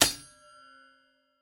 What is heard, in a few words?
Sword Click; Game rip; MiSide